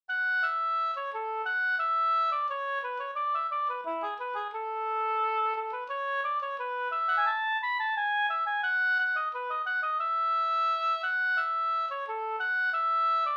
gånglåt fr Leksand; Solskensganglaten

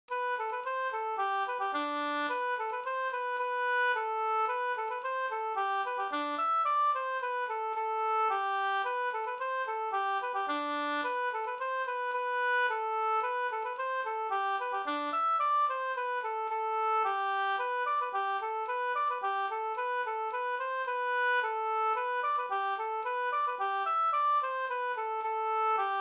folkdans; Treparsdans; Sodertorn Sd; Dybeck

